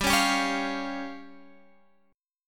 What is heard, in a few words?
F Suspended 2nd Sharp 5th G